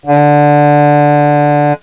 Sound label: tone